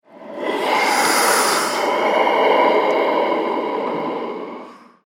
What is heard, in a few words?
tortoise galapagos breath hiss; fienup